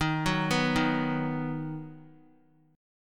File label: Eb Minor Sharp 5th, Guitar; Ebm#5 arpeggiated; 4F,4A,5C,5G,5C,6E; JGuitar